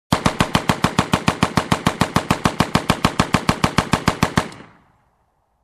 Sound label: Slow machine gun firing long